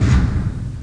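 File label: door library stairs thump; Myst Demo; Myst Preview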